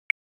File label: effect tick